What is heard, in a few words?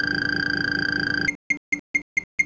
pips